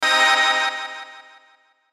Hit Stab Chord Delay, Stab Dark Low Hollow Scrape; Production Element Imaging Element Accent Transition; Stabs; Blastwave

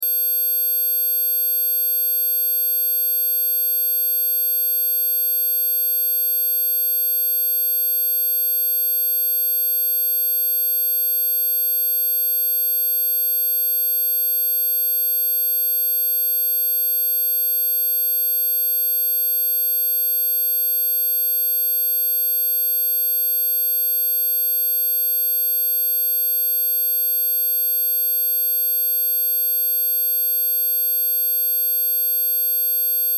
Test Tone Square; Square Wave 12.5Khz 60 Secs -18Db Loop; Tone Sound Effects; Blastwave; Technology

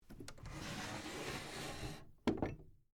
Drawer Open Table, Antique, Wood, Small; Foley; Drawers; BlastWave

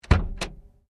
Hyundai Accent Bonnett Latch Open; Hyundai Accent 20 08; Hyundai hire car; Vehicles; Cars

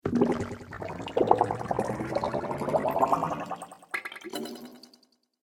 Water Space Bubbles, sci fi

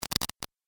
Static Electricity Charge; Miscellaneous Electronic Sound Effects

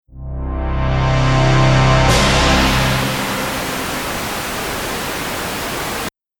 Trailer Hit White Noise; Trailer Sound Effects

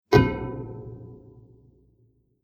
Treated Metal Hit; Metal Sound Effects; Impact / Explosion Sound Effect; Andrew Fleming

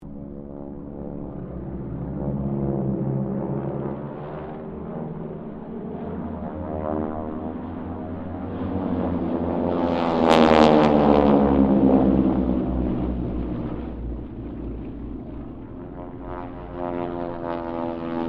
AIRCRAFT HARVARD SQUADRON 4 LOOP; Mixed aircraft squadrons; Airplanes; Vehicles

